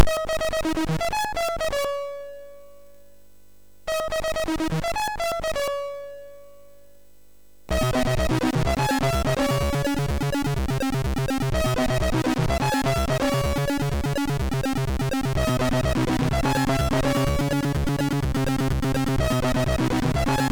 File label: Rave Techno, MOS6581R3; hvsc